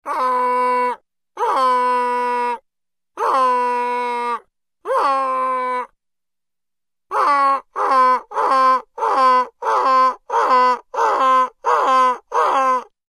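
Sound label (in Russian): гоготание гуся имитация голосом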